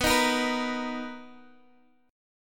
B Major 7th B down Guitar Open G

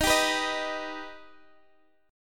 D sharp Augmented D sharp, Guitar, Drop D; x,x,13,12,12,11

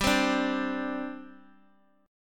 G Suspended 4th

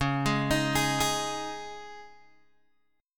Db Suspended 2nd Guitar Open G

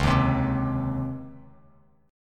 Db Major 7th Suspended 2nd Db Bass Standard; 9,6,10,5; DbM7sus2 strummed; JGuitar

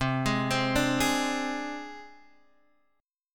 C Suspended 2nd Sharp 5th C Guitar Standard; arpeggiated; x,3,6,5,3,4; JGuitar

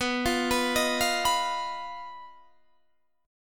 B Major Flat 5th B Guitar DADGAD

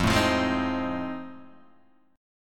F 7th Sharp 9th, Guitar, Open G

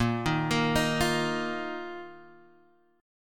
Bb Suspended 4th Bb down Guitar Standard; Bbsus4 arpeggiated

